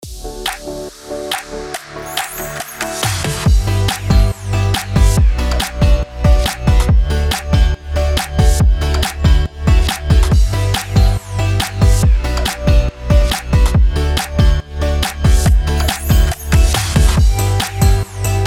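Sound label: CHRISTMAS G major 140BPM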